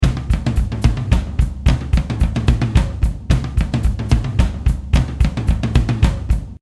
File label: drum